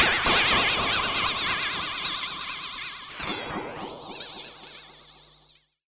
Ring Mod & Delay, contact mic Cymbal

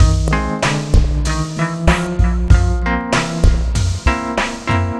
Acid Mod Full-F, lounge, 096; Zero-G Kocktail Kollection; Construction Kitz

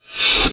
REV, SOUND EFFECT REVERSE

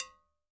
COW BACK, Percussion; Sampleheads; NYC Percussionworks; ST PERC VOL1

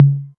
tom mid, Novation Drumstation

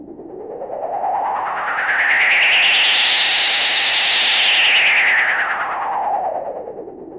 rattle sweep; Zero-G Ambient 1; RATTLESWEEPS

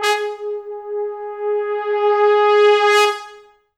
Pop Brass; Best Service ProSamples vol.19; AKAI

